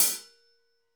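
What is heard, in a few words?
hi hat; HH-Kits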